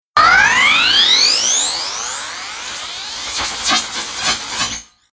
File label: Computer Interface Telemetry Static Start Up Digital Computer Riser Transformer Space; Tom Hutchings